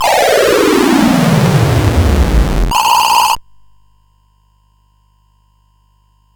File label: Dragonworld sid CSG8580R5